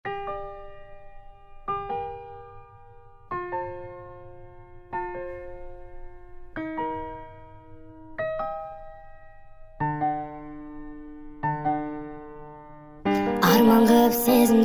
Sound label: acoustic speed version